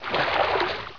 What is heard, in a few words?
swim; Hexen2; player